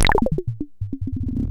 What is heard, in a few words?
Drop Drip, Cwejman S1